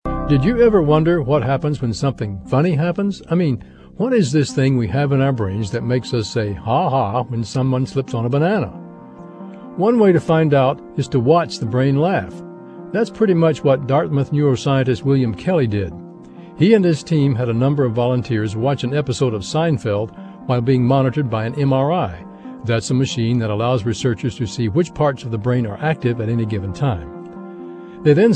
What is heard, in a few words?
laugh